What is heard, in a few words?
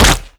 step close, zombie blind